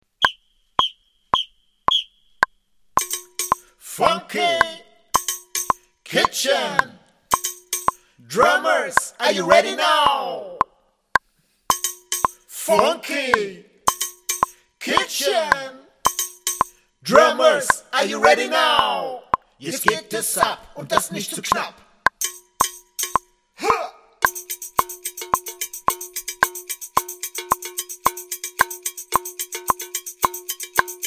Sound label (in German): Schellenring Glocke; My Funky Kitchen